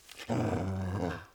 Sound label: ambient animal dog med inside growl; CSS